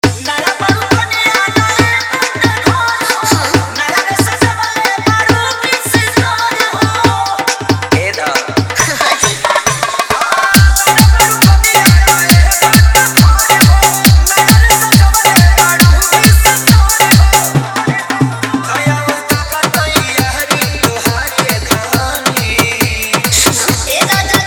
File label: Halka Sound Dj Remix Hard Bass Mix; Jaunpur